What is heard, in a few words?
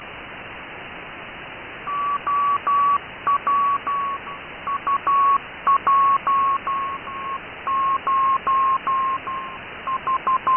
cw; opusat